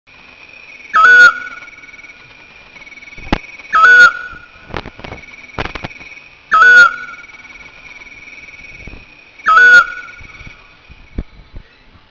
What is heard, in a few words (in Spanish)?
canto Otus scops Adulto